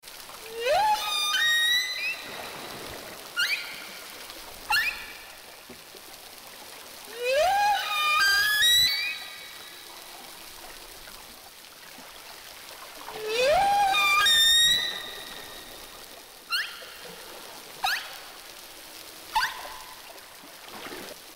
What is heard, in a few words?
kit